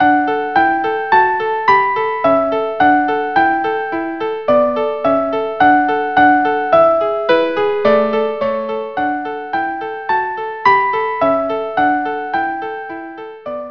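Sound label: violin duo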